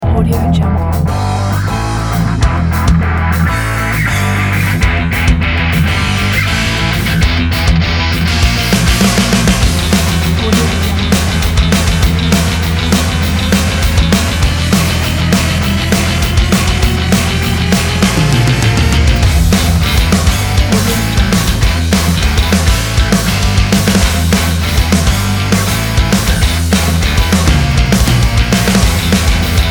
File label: punk